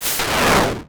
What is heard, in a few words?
Science Fiction Sci-Fi Electronic Radio Interference